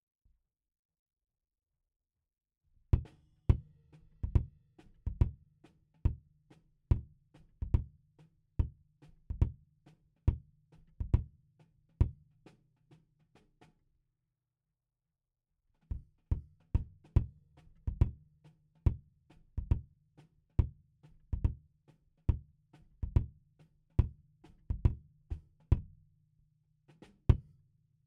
Kick In

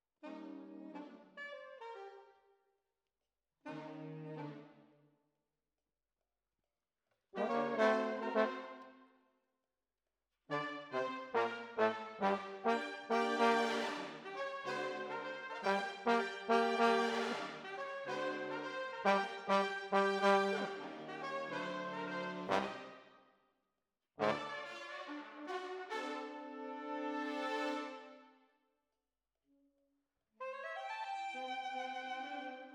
Trombones, jazz for piano

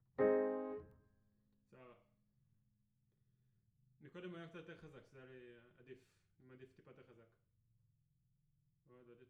Piano A

